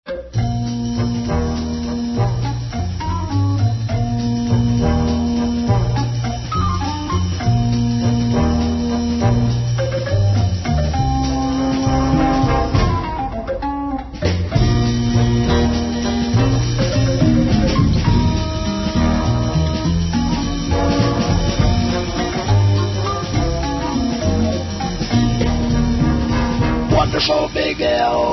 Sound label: radio london theme